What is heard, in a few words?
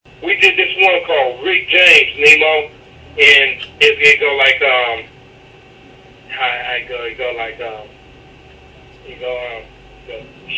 singing